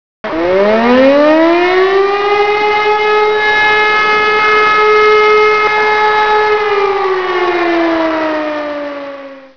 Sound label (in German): sirene